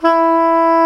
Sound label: SAX SOP MP E, Soprano Sh; Roland LCDP07 Super Sax